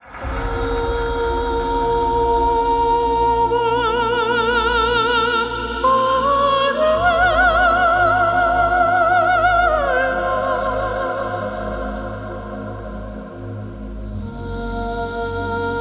vocalise

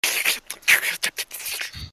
Intense slurps

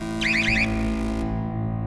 turret alarm

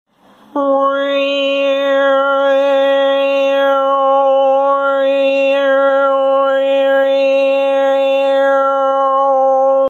Hindi raga

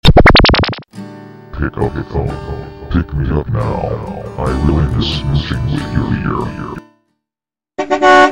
Urgent Message

